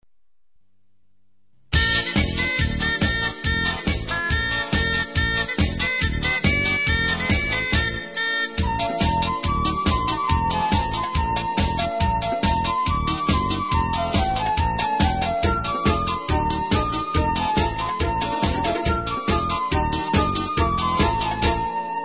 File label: Instrumental